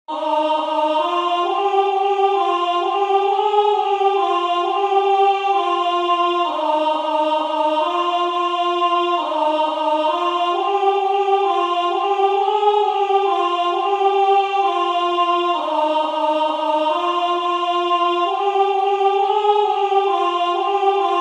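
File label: Ubi Caritas Alto; Durufle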